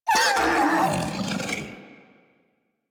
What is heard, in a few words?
Feral Vocal Death; Warframe; Kubrow